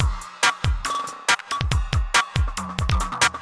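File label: industrial 140 kit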